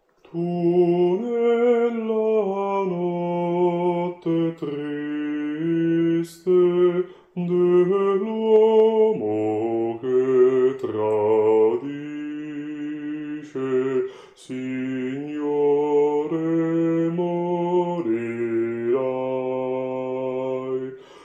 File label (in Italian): Bassi